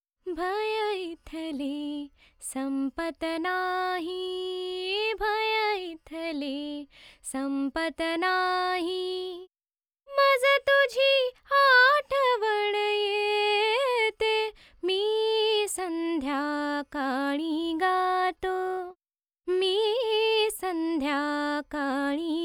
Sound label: Dry 4-4 D 100, Vocals Female; Sample Banks